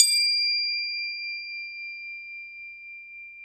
Crotales, Orchestral Perc; Roland LCDP03 Orchestral Perc

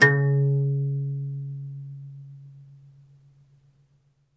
OUD C#2 -L; E-MU Producer Series Vol. 7 – Old World Instruments

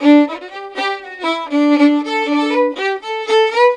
120 D violin, Celtic Flavours; USB Soundscan vol.42; AKAI

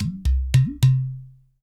NYC Percussionworks, TI LOOPS 96; Sampleheads

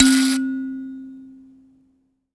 Finger, Prepared Kit; Battery 3 Sample Kits

